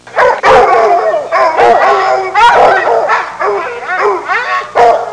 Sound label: Hound kennel barking